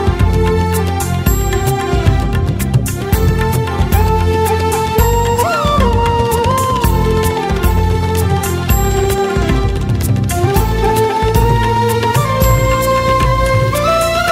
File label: Malayalam Tabla Music